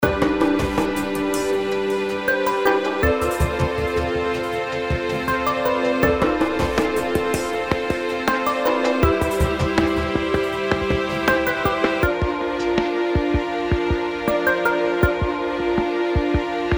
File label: BGM